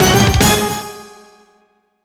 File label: Game Start